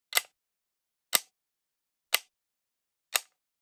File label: Generic Button Metal Small; boom library; The Interface